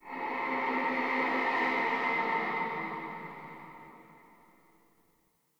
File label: Pump Shotgun Reload Void Layer C; Weapons; Warframe; Zariman